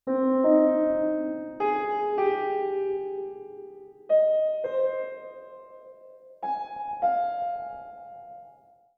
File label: Tension Piano; Mob of The Dead